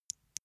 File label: eye wink, comedy cartoon; koerpergeraeusche